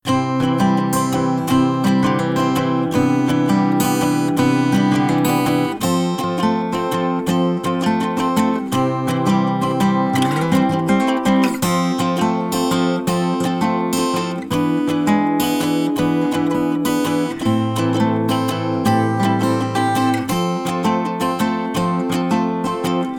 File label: (instrumental)